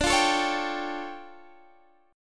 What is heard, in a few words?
D Minor Major 11th, down; DmM11; strummed